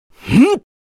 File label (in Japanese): 「ふん！」; スキル（ダイレクトキック）